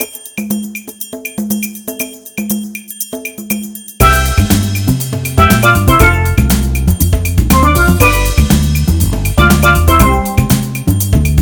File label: music havana market; Sony Ericsson; A8i; cmcc